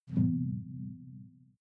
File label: sci-fi alien bloom soft; swooshes-swishes